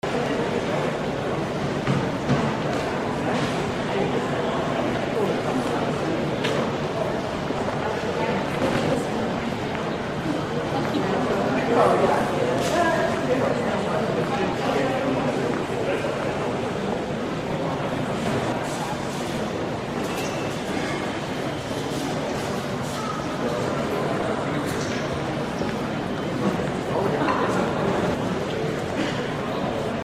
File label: atmo airport large crowd